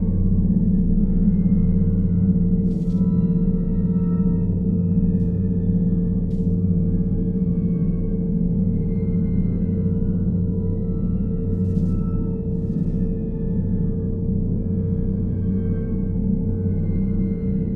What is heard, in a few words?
amb gravity storm; Stellaris; cosmic storms